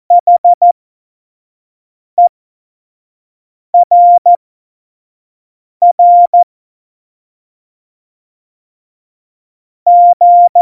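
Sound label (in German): Witz, Morsekurs, cw morsen; Witze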